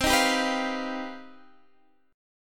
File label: C Minor Major 11th C